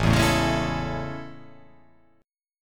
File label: A Major 7th A